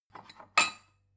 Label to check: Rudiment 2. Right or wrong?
Wrong